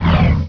heatray loop, weapon

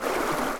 water short

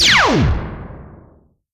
aoe aura, weapon

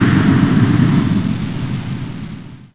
bomber move

